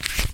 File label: flip